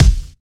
clear kick drum single hit d key, kick single shot tuned to the D note